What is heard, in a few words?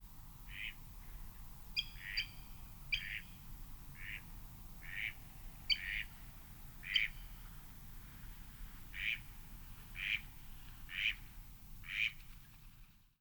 pik nfc